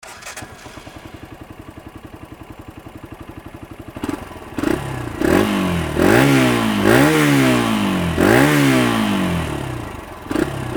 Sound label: klx125 barrel